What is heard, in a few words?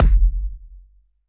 kick verb